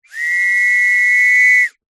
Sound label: whistle two tone single long blow close up; foley; zapsplat